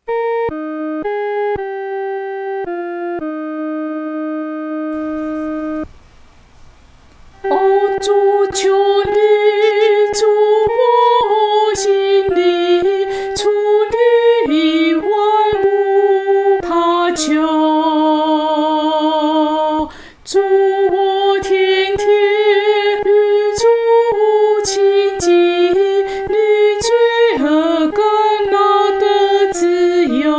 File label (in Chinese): 独唱（第一声）